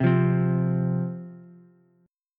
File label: F 5th F, down, Ukulele; F5 strummed